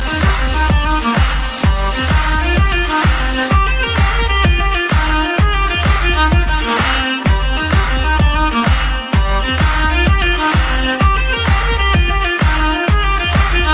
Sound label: irish techno